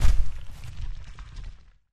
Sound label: DEBRIS IMPACT SHORT, rock & sand